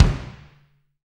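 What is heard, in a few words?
bass drum, Roland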